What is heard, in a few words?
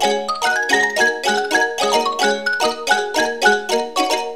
upbeat china, Loops Electronic